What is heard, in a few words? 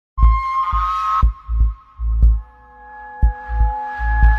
whistle; gooby woobies